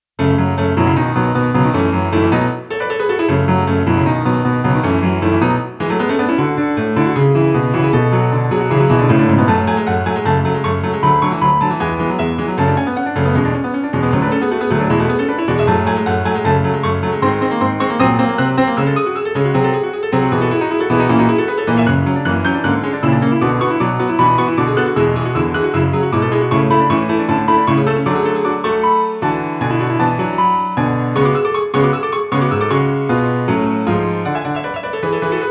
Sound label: Piano